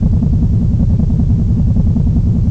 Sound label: city rumble loop